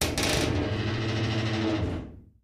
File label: Vault Outer Door Open; safes & vaults